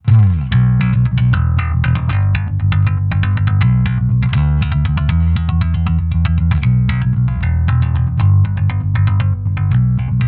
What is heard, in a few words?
Ampeg SVT